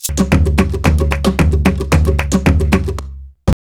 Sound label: SHAKER, Modern Percussion Loops